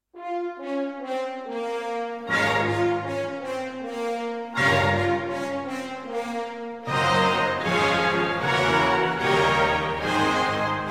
Piano Concerto No. 1 in B-Flat Minor, Allegro Non Troppo e Molto Maestoso